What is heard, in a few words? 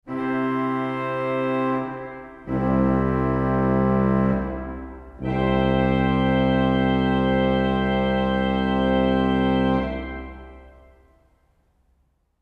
Trompete 8